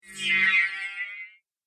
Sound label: Passing The Laser Beam; Laser Sound; Sci-Fi Laser